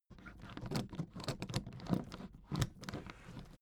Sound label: suitcase handle handling